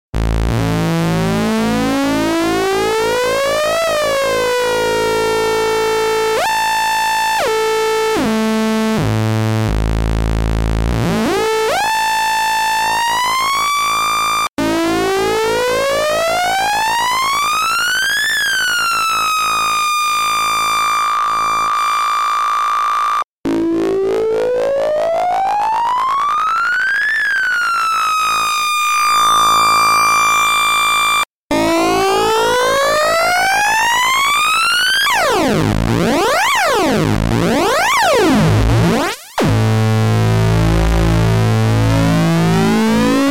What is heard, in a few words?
oscillator sync